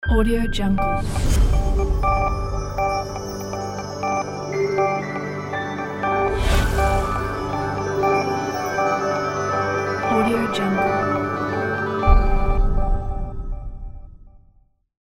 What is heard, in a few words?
flowing stylish logo reveal; scoringaudiogeeks